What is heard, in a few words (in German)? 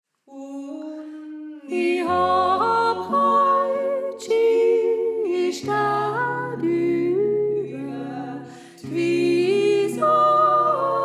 Sopran; Und I Hab Halt Schö Staad